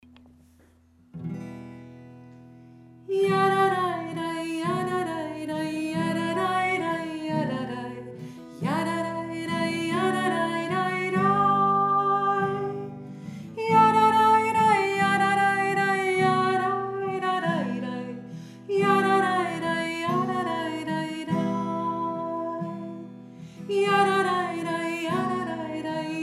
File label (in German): nigum